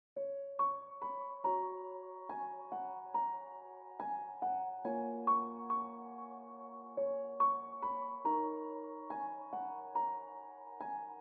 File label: Ringtone